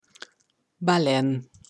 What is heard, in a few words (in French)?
Baleine